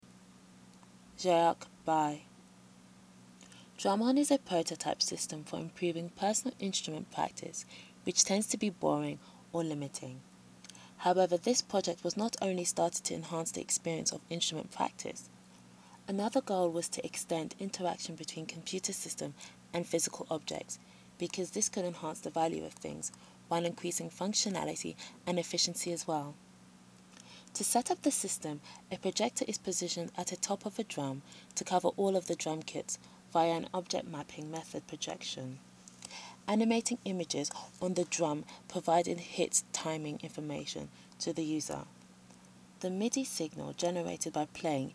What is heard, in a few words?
drum; english